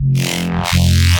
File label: Reese Bass F; Antidote Advent